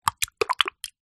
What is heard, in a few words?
cartoon slime gloop slop movement